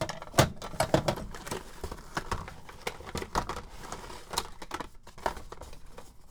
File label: Rummage, Toolbox Rummaging